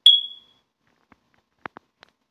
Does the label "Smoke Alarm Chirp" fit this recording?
Yes